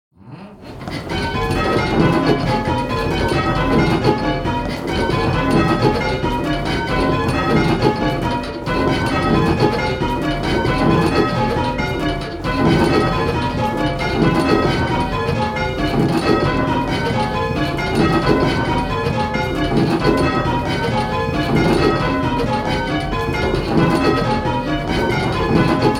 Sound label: Dorian Yorks, bells, Yorkshire; Major